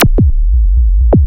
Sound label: FX, attacks